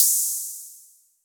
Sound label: HHo Blofeld; Waldorf